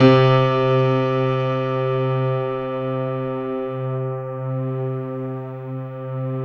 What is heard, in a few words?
PIA, STRG; Best Service Gigapack 1